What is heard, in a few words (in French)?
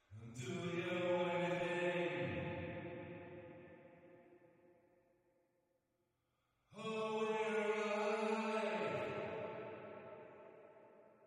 vocals back reverb